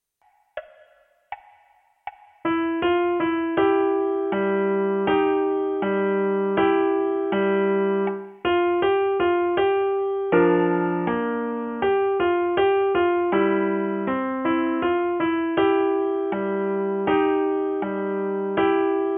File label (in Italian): piano unite